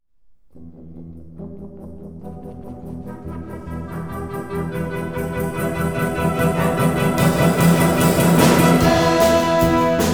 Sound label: IN BRASS; game music; super depth